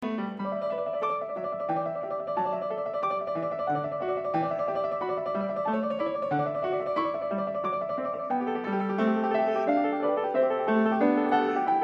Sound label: Bach Goldberg Variations a 2 Clav, PIANISTS